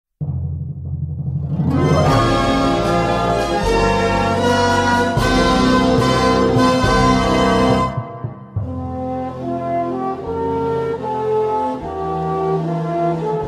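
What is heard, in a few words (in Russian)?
gimn dagestana instr, Dagestan anthem instrumental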